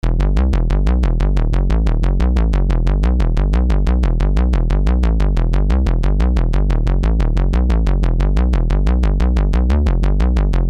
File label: bass 303